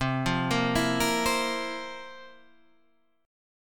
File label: F Major 7th Suspended 4th